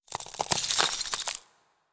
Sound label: Abyssalflame Whirl I C E; Legend of Keepers